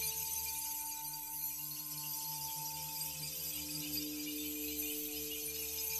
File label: fairy Magic Loop; fairyred